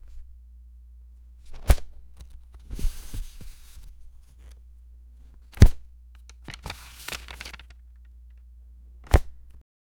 ruffling through a dvds o, rummaging

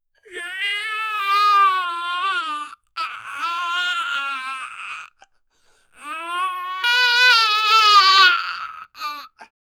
boy crying

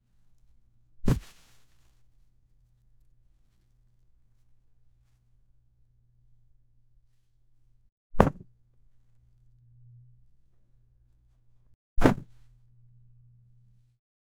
a man falls on the; falling

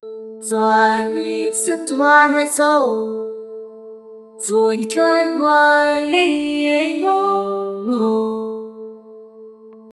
triumphant

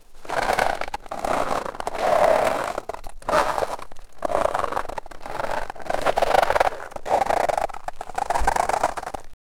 eight reindeer harnessed, sled

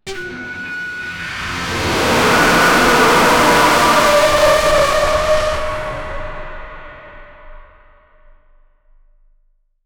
the sound of reality shat, portal

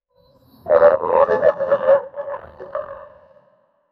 a telepathic animal voice

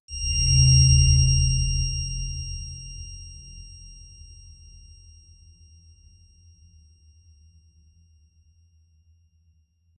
a rather fast tension sfx

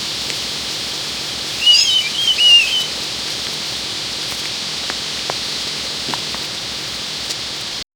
soft moans of the deer

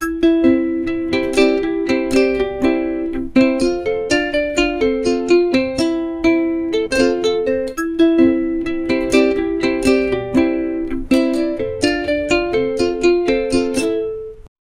happy upbeat commercial, summer

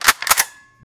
firearm

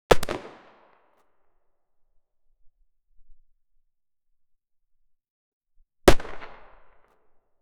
single sp5a3 9mm gunshot

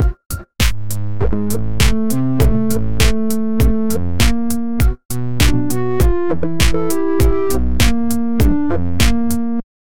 cultural

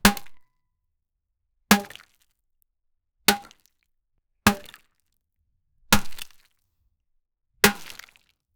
sounds of a watermelon hi, fruit

